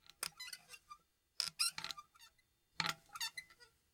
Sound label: levier Creak; levier Creak New; Puddle; puddle plus ios gamerip 2018